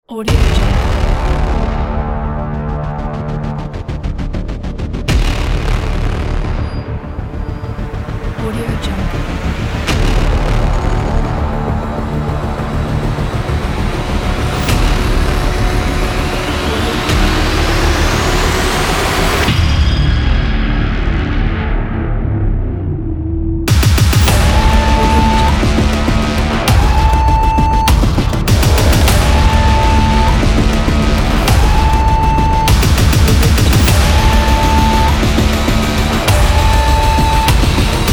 aggressive trailer teaser

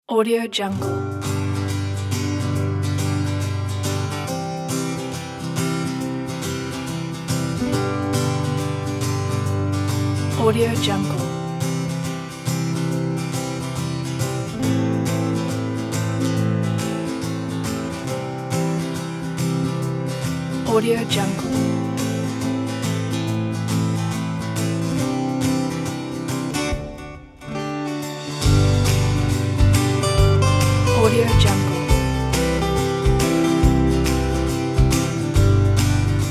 inspired guitar

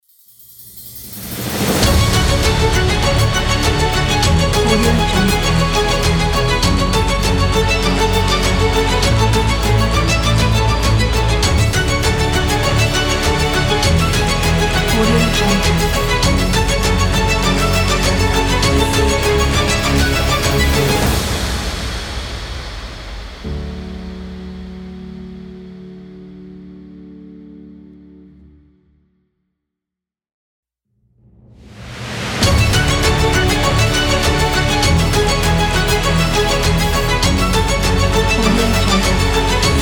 classical logo

